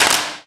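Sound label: physics clipboard impact small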